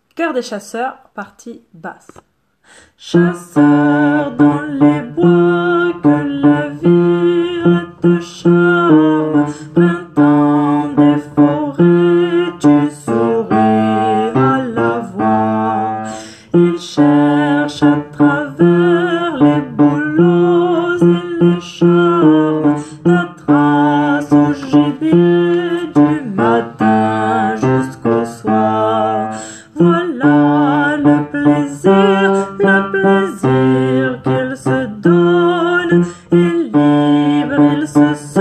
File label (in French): basse; Choeur des chasseurs